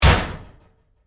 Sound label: Door Locker Impact Roomy Hard, Metal Door; Metal Doors